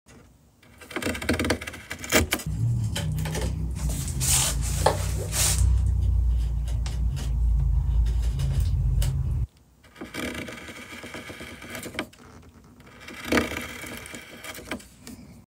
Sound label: Mosaic; معرق کاری